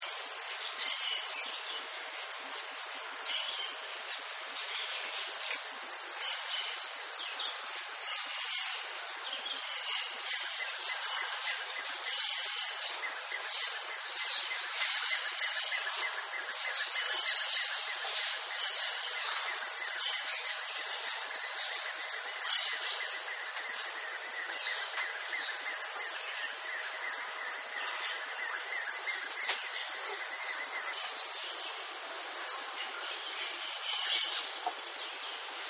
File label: Jungle Ambience, Nature Scenes; Backgrounds; Forests and Jungles